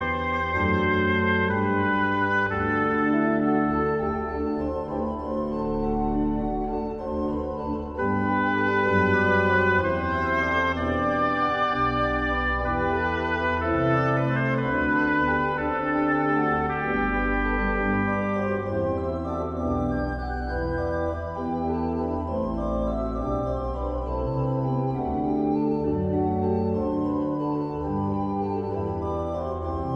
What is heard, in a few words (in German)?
Jesus bleibet meine Freude orgel